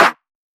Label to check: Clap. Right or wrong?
Right